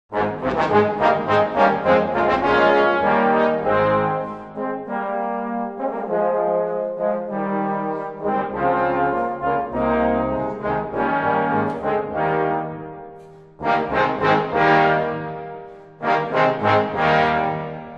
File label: brass ensemble